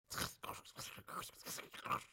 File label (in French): sachet plastique; mouth-fishing